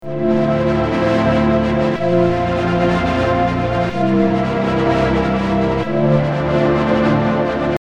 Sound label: Whistful Chord Sequence